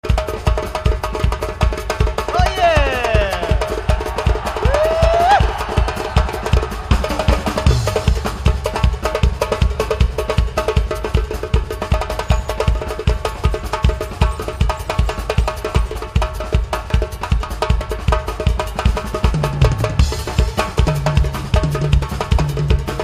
Percussion Jam

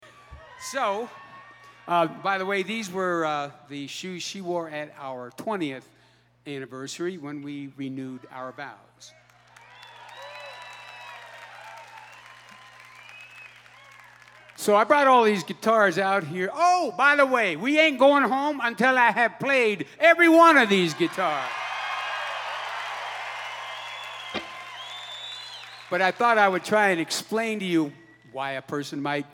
Guitar Talk

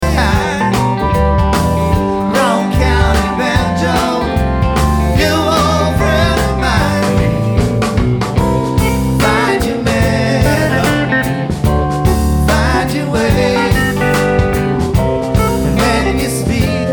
Banjo

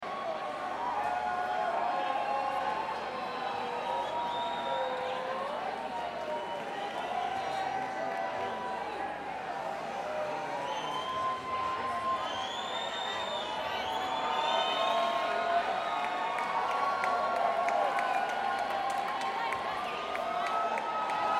Crowd

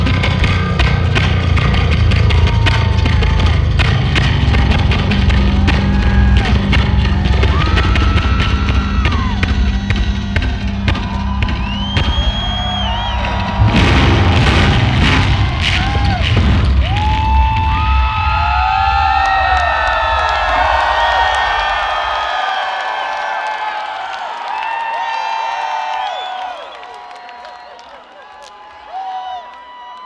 Bass Solo

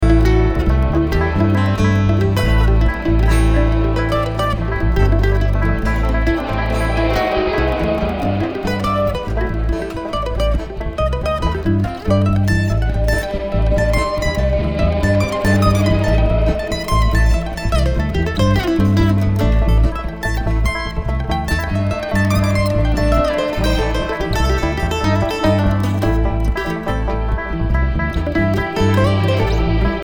Traffic Jam

